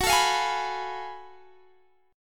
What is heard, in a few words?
Gb Minor Major 11th Gb down Guitar Drop D